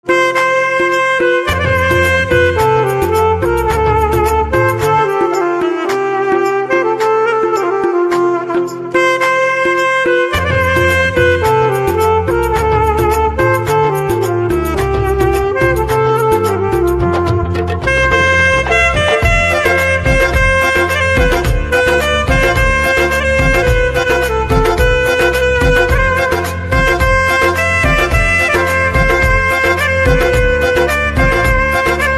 Saxophone

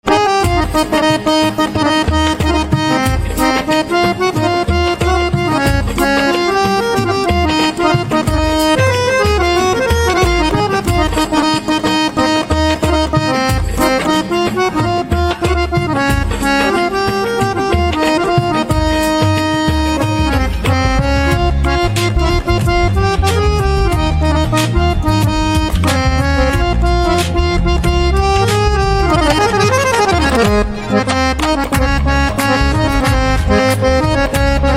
Harmonium

